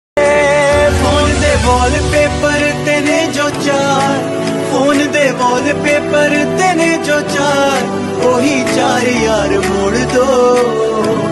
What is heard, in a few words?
punjabi ringtone